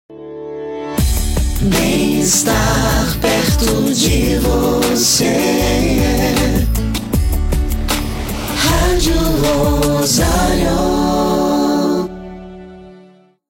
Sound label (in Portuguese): VH JINGLE RADIO LENTA; shopping; ROSARIO